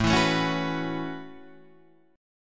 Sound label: A 6th Add 9th A down Guitar Open D